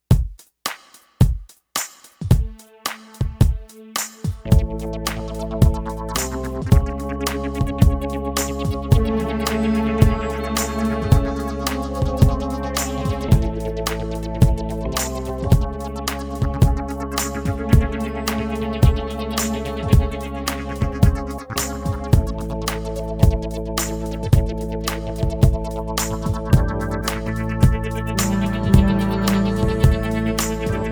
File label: Instrumental